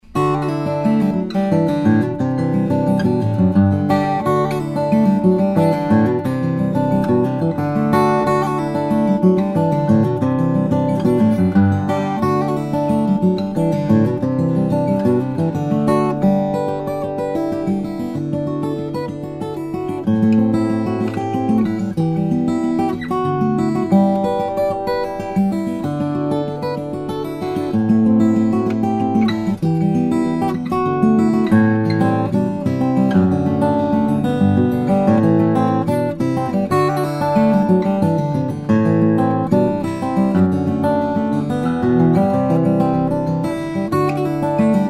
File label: Wedge Body, steel-string guitar, flattop; blanchard bristlecone; Madagascar/European; manzer wedge om; Mark Blanchard